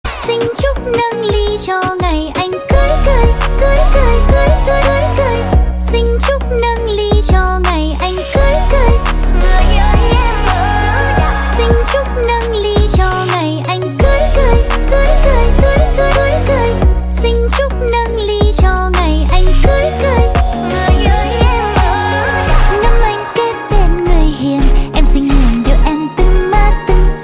nhac chuong synth

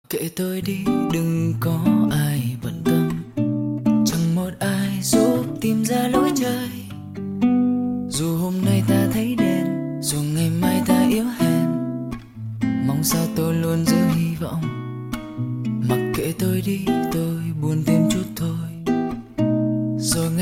nhac chuong

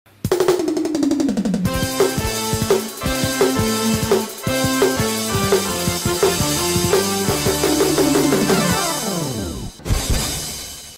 nhac chao mung mc dam cuoi organ

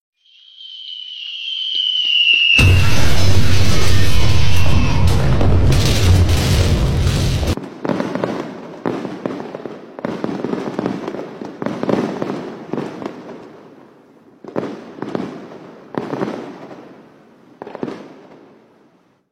nhac chuong happy new year khong loi

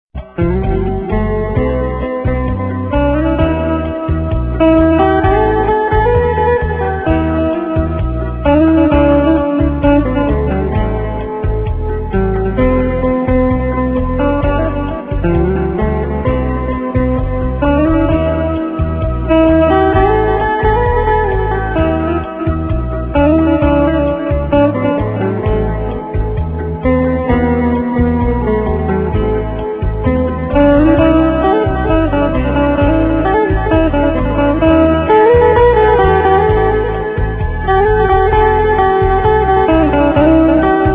nhac chuong khong loi

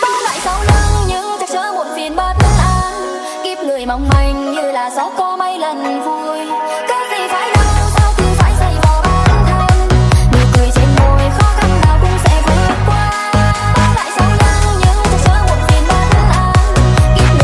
Nhac Chuong Remix

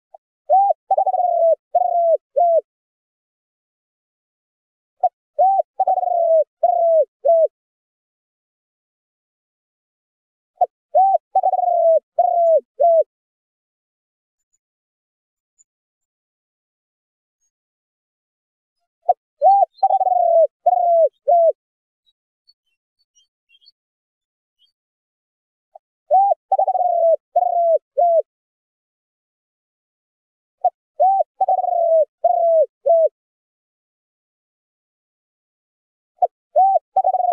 Tieng chim Cu gay giong chuong tho dong; goi bay

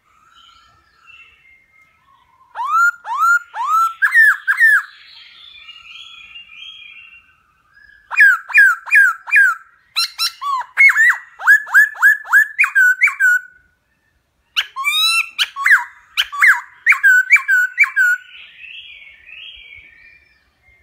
Tieng Khuou hot hay nhat viet nam

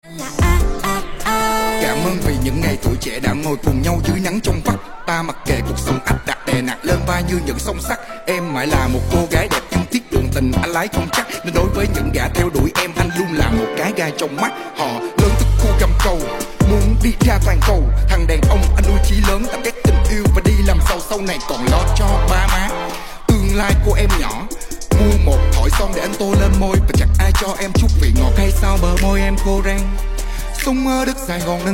rap viet

